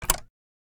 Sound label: Switch; SNKRX